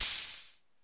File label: Electro SPRAY; Central Processing Unit; Electro Samples Vol. 2